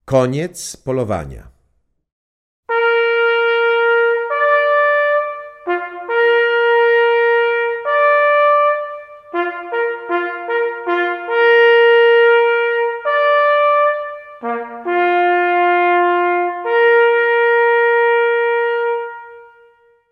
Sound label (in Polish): koniec polowania solo